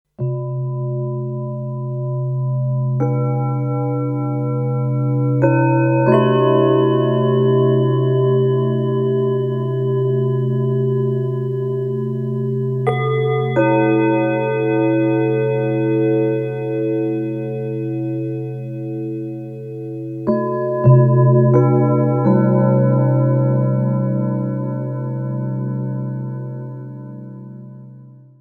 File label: Fixed Ratio Harmonic Bells